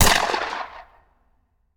Rifle; Sound Ideas; Guns

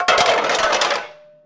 Dishes Pans Rattle; Foley - Rattles

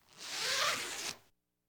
Household Phones

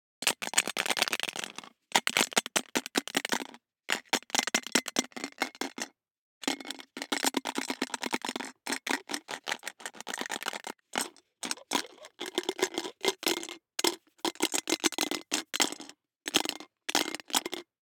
DICE in Plastic Cup Closed, Shake, 2 Two Dice; Samples; ArticulatedSounds; DICE Sfx Party (Extended)

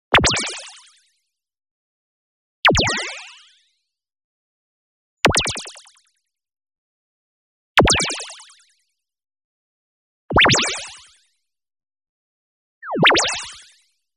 tonal, button bubble; boom library; Anime Essentials

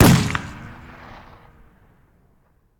Bullet Impact; Sound Ideas; Guns

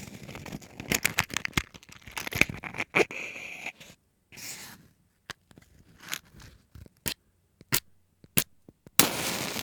matches light; best service Blue Box

